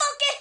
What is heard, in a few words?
Funky, Yamaha PTX8